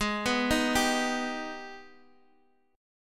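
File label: Ab Minor Major 7th chord, Guitar, Drop D tuning; arpeggiated; x,x,6,4,4,3; JGuitar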